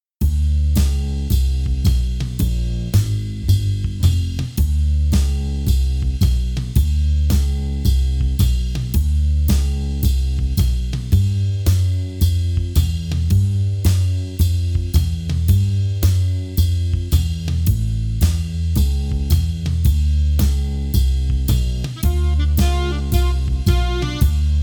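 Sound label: backing track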